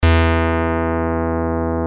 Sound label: online guitar tuner e2